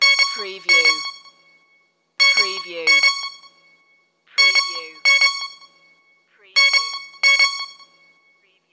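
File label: Cell Phone 4 Rings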